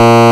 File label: Sawtooth A#1; Ableton Foundation FreePacks; Foundation Glitch Toys & LoFi